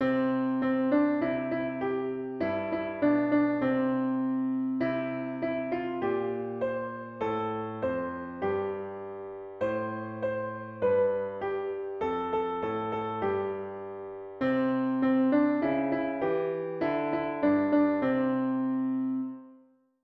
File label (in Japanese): ピアノ